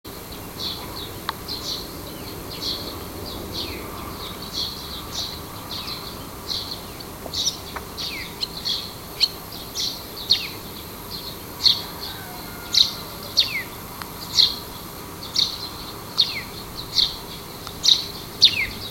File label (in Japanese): Kotori saezuri